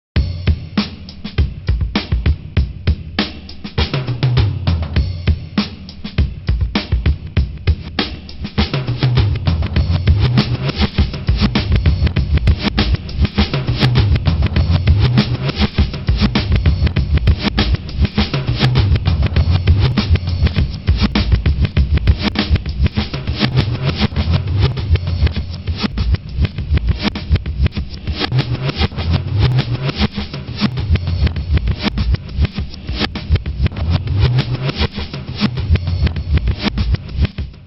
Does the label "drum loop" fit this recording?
Yes